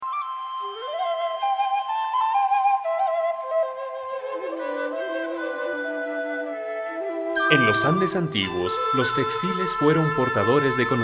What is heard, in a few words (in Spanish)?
Intro 3 flautas